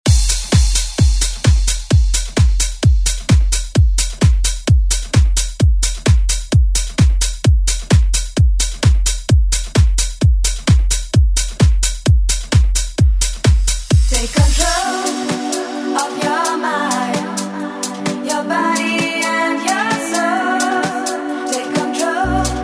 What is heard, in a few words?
90s